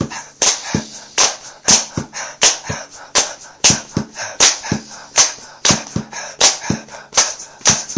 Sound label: my mind cant break me percussion beatbox; REMIXABLE COLLECTIONS; Folksy Guitar and Voice Stems